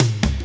original tom single hit a sharp key, Royality free tom tuned to the A# note; tom drum